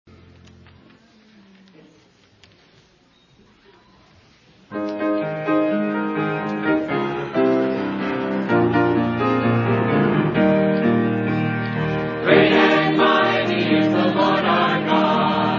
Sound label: choir